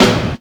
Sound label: PERC, 180 BPM; Ueberschall; Drum & Bass Flash Trackz